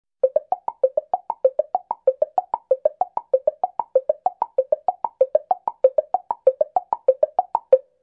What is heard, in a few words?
Woodblock ascending arpeggio